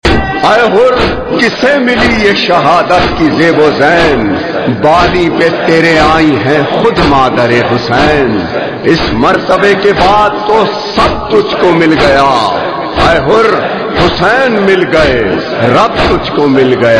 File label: noha tone ringtone